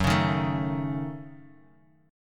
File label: F sharp Minor 6th; strummed; 2,4,1,2,x,x